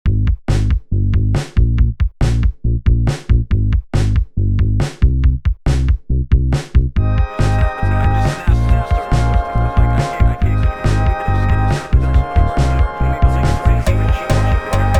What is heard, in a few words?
beat